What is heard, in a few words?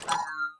ui button confirm